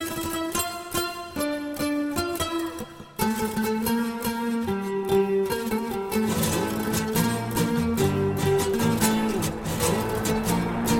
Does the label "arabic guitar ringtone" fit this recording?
Yes